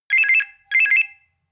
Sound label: telephone